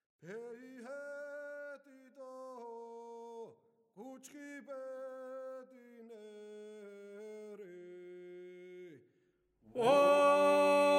კუჩხი ბედინერი მეგრული პირველი ხმა; სასწავლო; გალობის უნივერსიტეტი

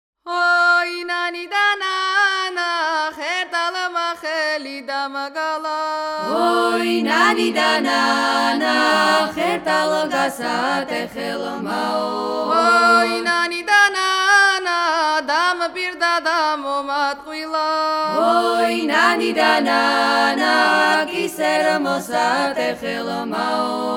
ხერტლის ნადური აჭარული; გალობის უნივერსიტეტი; სასწავლო

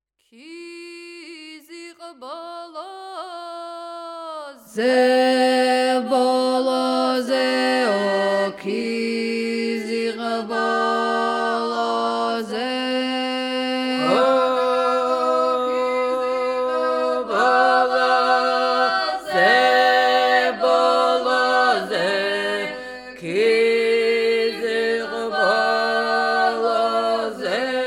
ქიზიყ ბოლოზე მოხეური მესამე ხმა; გალობის უნივერსიტეტი; სასწავლო

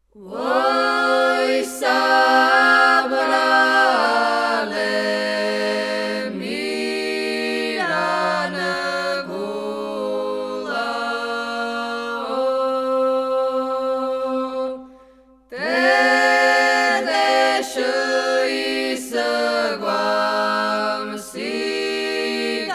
მირანგულა; გალობის უნივერსიტეტი; სასწავლო

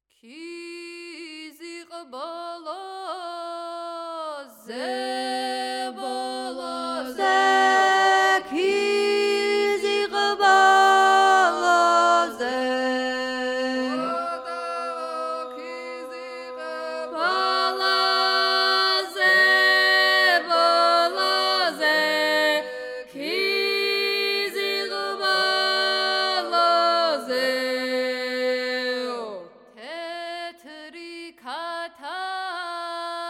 ქიზიყ ბოლოზე მოხეური პირველი ხმა; გალობის უნივერსიტეტი; სასწავლო

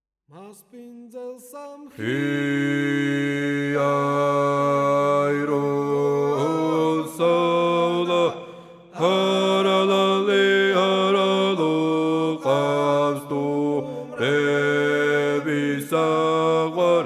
მასპინძელსა მესამე ხმა

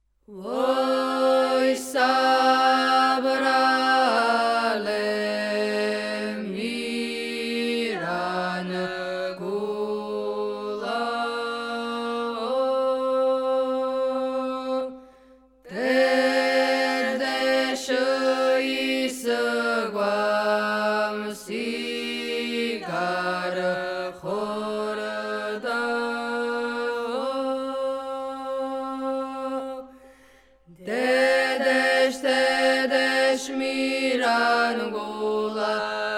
მირანგულა სვანური მესამე ხმა; გალობის უნივერსიტეტი; სასწავლო

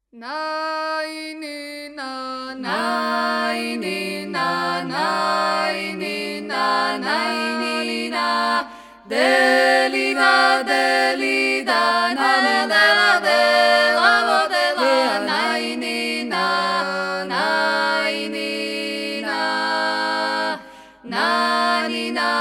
ნანინა გურული; გალობის უნივერსიტეტი; სასწავლო

